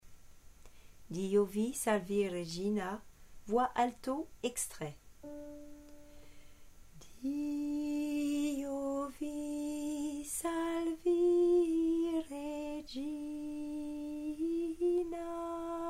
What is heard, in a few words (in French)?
alto